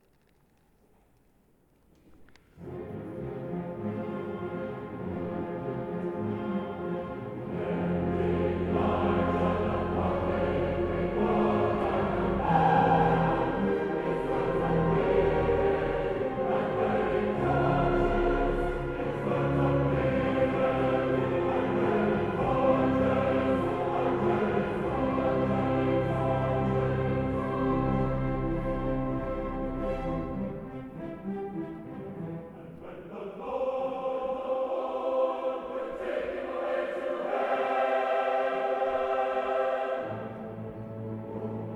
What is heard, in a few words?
Then did Elijah the prophet break forth (chorus)